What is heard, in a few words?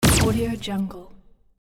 futuristic weapon; futuristic weapon 03